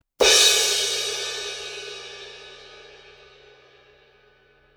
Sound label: thin crash 18 hit, cymbal; sabian